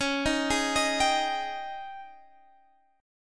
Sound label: Ab Major 7th Suspended 4th Db